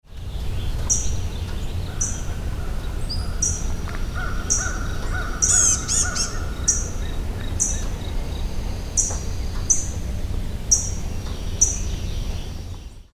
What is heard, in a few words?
Prothonotary Warbler; Wicomico MD